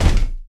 Afro Feet; Roland BK-5; Percussion

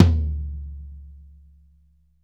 DRY FLOOR, Drumworks; Sampleheads - New York City Drumworks VOL-1

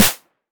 Clap Pairs

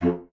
F2, bassoon; Xsample VOL-6; C BASSOON

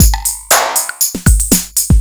FLAGED CLA 119, LOOPS

